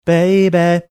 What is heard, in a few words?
singing baby